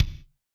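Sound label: bass drum gate, Kick, Yamaha DTXpress-II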